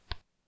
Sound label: ball hits hand clean; vanitas